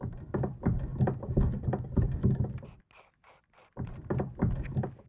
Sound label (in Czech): naradi; Mafie 1